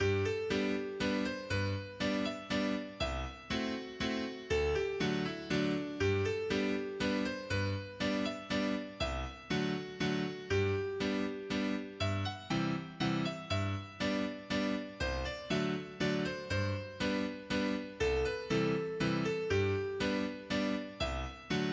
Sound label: Zither Carol G